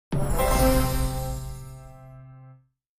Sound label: Challenge passed; Piano app by Yokee